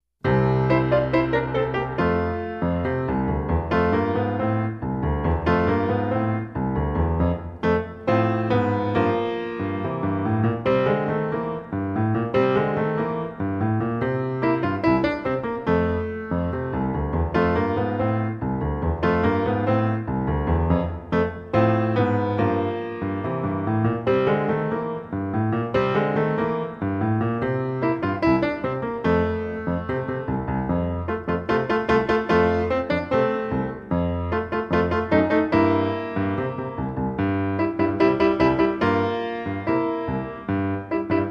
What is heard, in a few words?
La Cucaracha accomp, trumpet; Hal Leonard - The Canadian Brass Book Of Easy Trumpet Solos